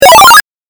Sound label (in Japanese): 8bit 詠唱